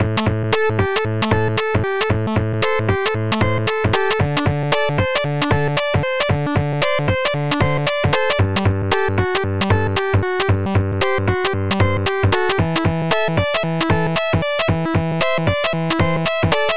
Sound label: pulse, chiptunes